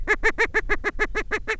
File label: cackle